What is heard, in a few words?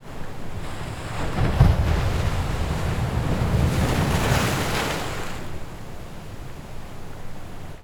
SFX Medium Wave Splash on Rocks, The Black Sea; Chris Skyes